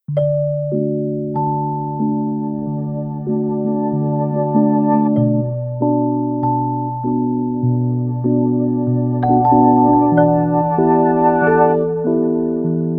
Marimba Vibraphone Chimes Bells

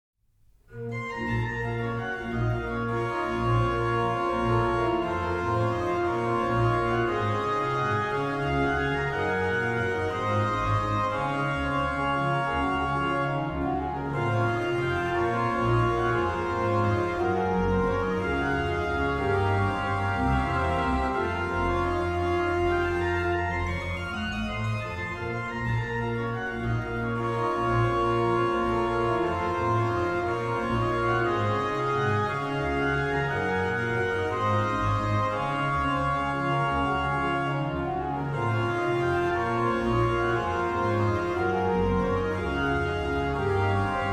Bach Organ Works; BWV 617; Herr Gott nun schleu den Himmel auf; Orgelbchlein

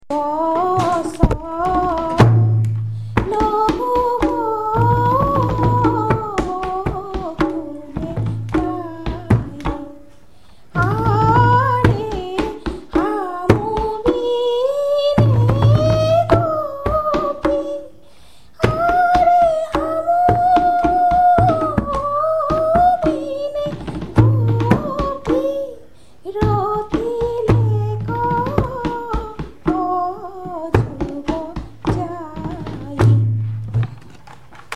sister with drum, Field Recordings from India; WFMU

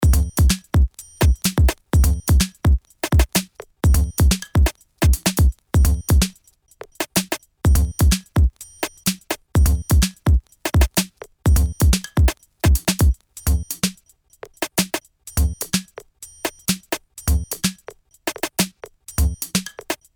126 cha cha loop

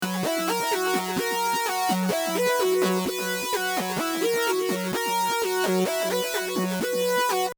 127 uk synth; Loop Mania Sampler Pack

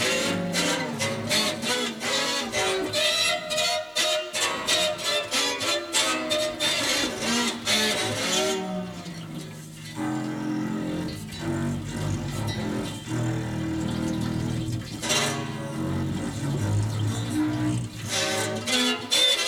Tromba Mariner

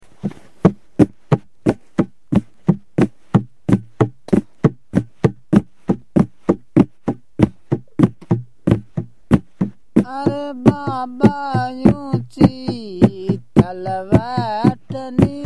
camel guide song, India; WFMU

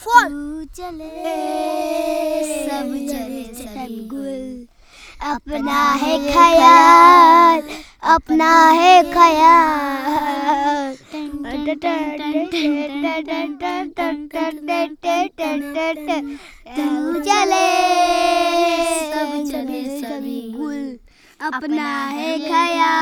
Sung by Three Children Jodhpur, Folk Songs; Tu Chale; Samuel Corwin; Selected Field Recordings from India and Nepal

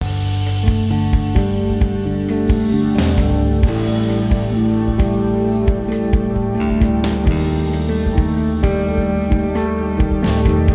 Spacey Dreamy Chill Slightly Dark with bass drums; CUES for film TV games etc

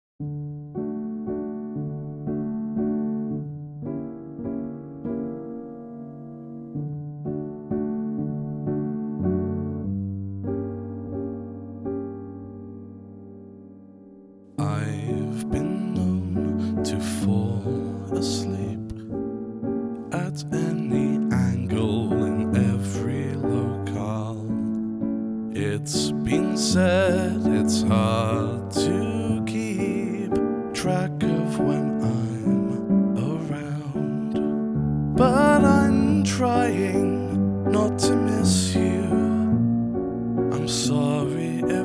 Lullaby, piano

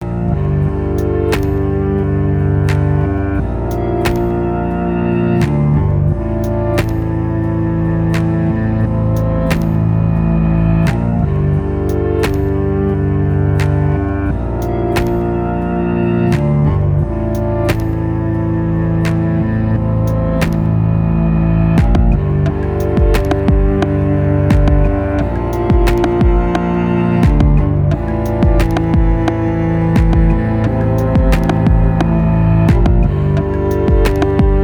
Sad-HipHop-Backmasked piano cello; CUES for Film TV Games etc SOLO PIANO

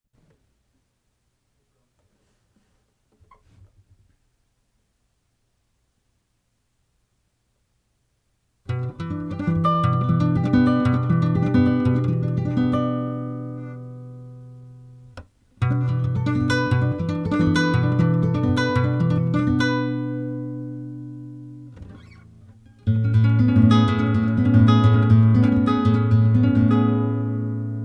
Forest Creatures

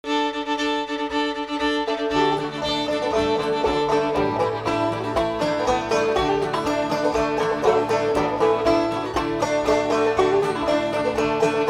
Polka; Dennis Murphys Polka

